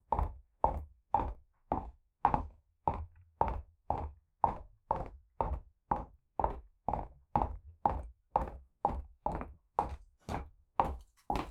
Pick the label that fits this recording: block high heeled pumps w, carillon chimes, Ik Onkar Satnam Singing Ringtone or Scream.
block high heeled pumps w